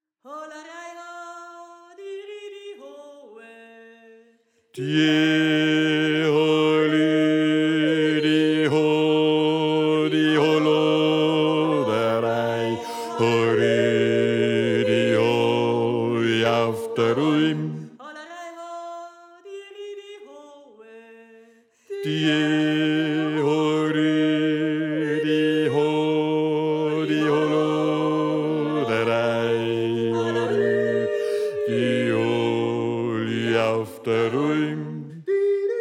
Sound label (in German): Steirer Bass